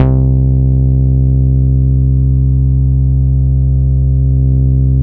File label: BAS C2, 2 Step; USB Soundscan vol.51; House Side Of 2 Step; AKAI; BASS